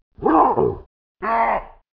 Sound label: young old, Baboon